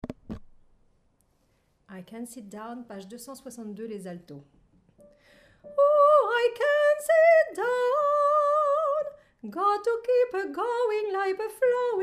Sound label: Alto